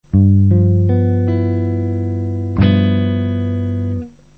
g7 add6 shell

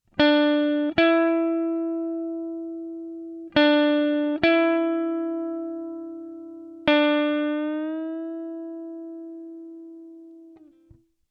bend

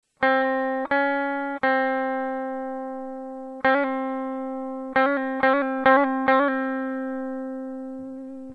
hammer pull

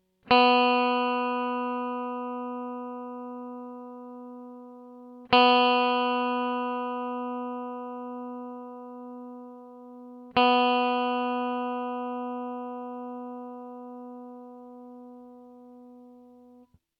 open e high b

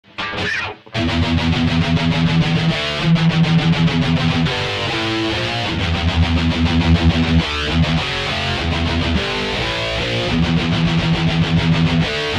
thrash

